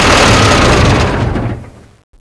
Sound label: power down, tank